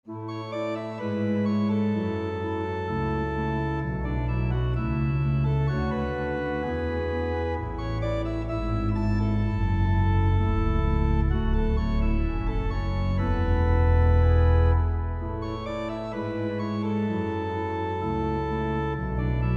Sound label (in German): Orgel; Osterspaziergang